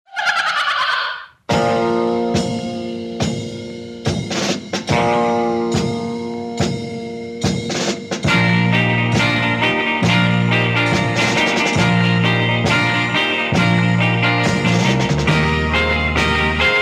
tremolos

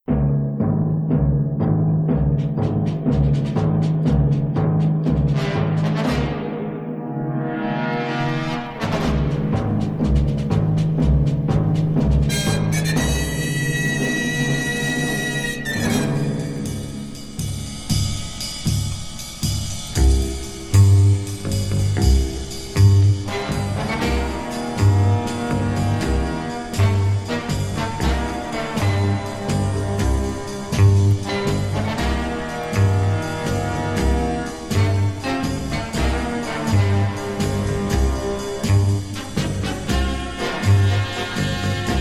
strings with brass

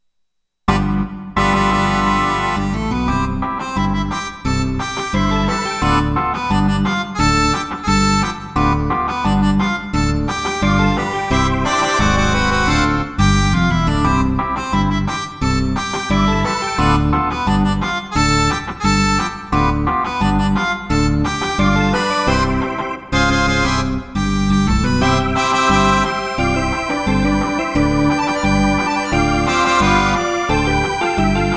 cha cha cha

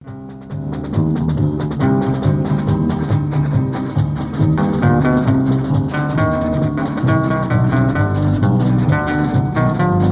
instrumental

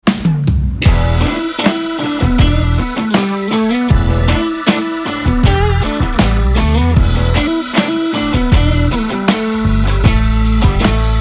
funky blues

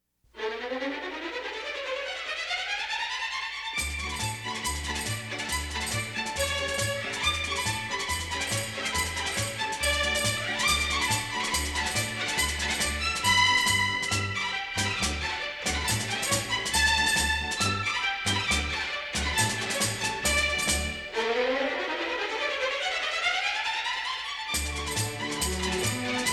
brazilian polka, orchestra strings

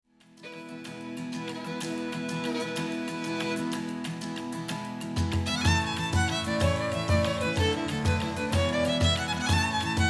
irish dance; Тайна печати дракона - Оригинальный Саундтрек (2019)

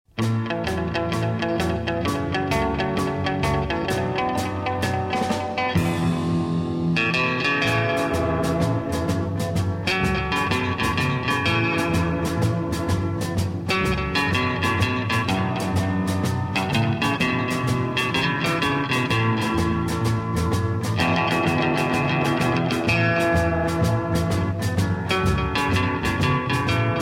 riding guitars

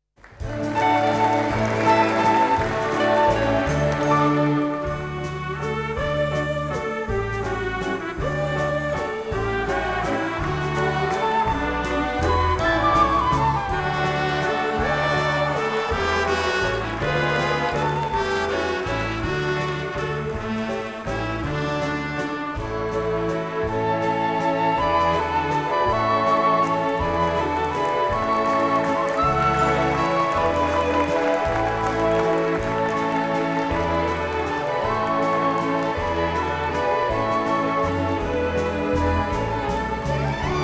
viennese waltz; Сборник танцевальной музыки

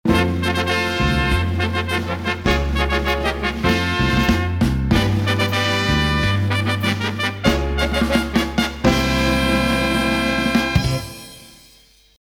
fanfaryi; Оркестр цирка Medrano, 2000г.

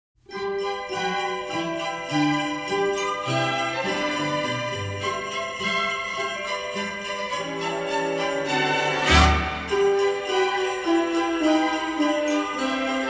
jingle bells, orchestra

